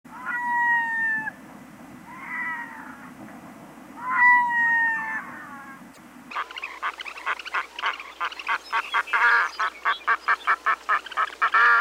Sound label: Red Throated Loon; citizen science